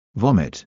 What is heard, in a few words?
vomit